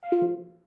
comms off; Apotheorasis

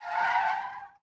skid short; Blind Drive

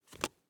Face Mask, Medical; Blastwave

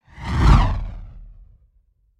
Fire Ball Passing Flying Fire Ball; Hollywood Edge; sound designer's toolkit v2

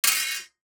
DICE on Metal, Throw and Roll, Mini, Three Dice; Samples; ArticulatedSounds; DICE Sfx Party (Extended)

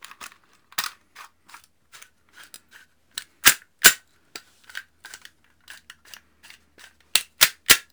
Gun Handling Weapon, Weapons Foley; SFX Kit

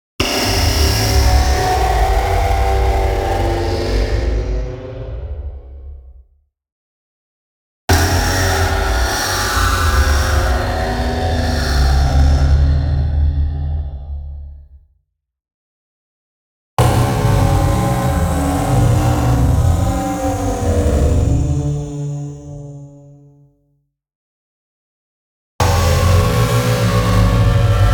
BLAST Splash Damage; NEON; boom library

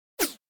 Button Whoosh Sci-Fi Small Air Button Beep; Hollywood Edge; sound designer's toolkit v2

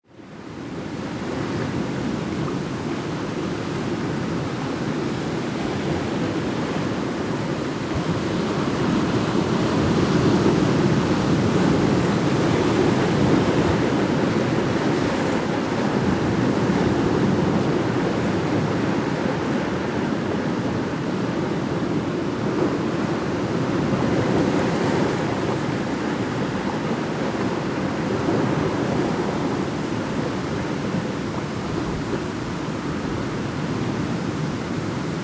sea waves wind stones; best service Blue Box; sea ocean

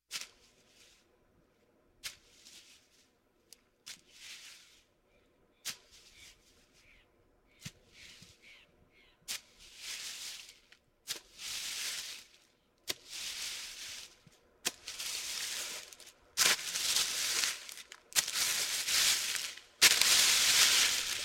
Monster Dragging Foot; Halloween Havoc - Sound Effects; Ktel

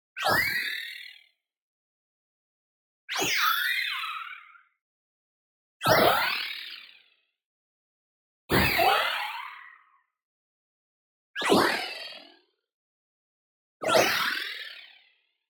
tonal, friendly ascent down; boom library; Anime Essentials

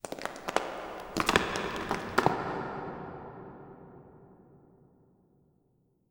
Rocks; Inquisitor 3

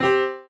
PUZZLE Success Piano Two Note Fast Dry; stereo; Universal Sound FX

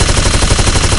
Assault Rifle Shot Loop; SFX Kit; Weapons

